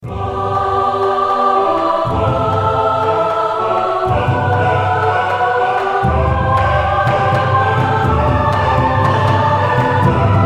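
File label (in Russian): драматическая хоровая кульминация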